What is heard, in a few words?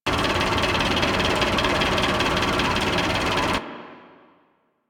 Computer Room Fan A; Warframe; Ambience; Grineer; Grn Computer Room 1